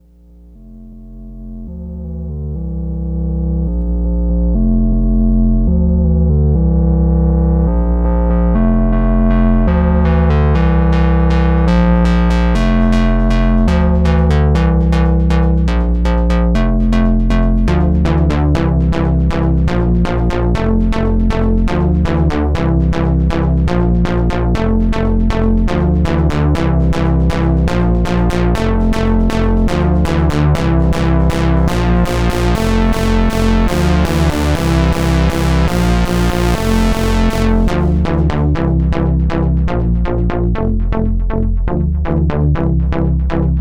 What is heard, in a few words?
Duphone EnvPWM Behringer 2600